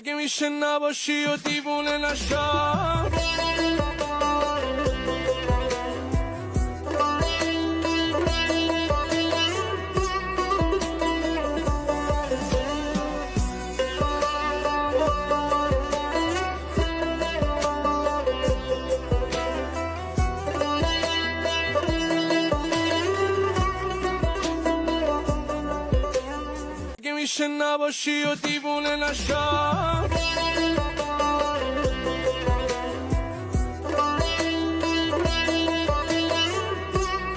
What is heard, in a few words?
tar instrumental version